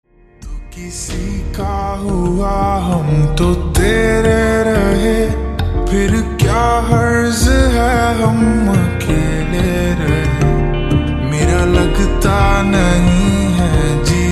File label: Slowed Reverb Ringtone